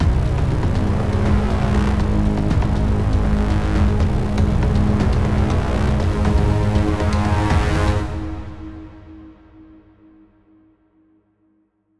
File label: Mission Fail Stinger; Fleets of Sol